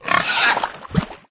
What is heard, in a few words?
Pig Dive